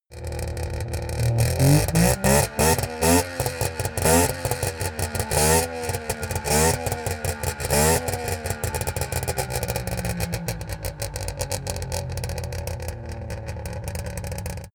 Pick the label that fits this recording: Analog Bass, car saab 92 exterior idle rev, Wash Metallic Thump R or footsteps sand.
car saab 92 exterior idle rev